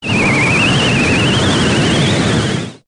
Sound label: Tornado